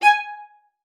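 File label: G#5, viola spiccato; Xsample VOL-5; 90 s Sample CDs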